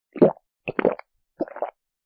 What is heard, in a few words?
drinking water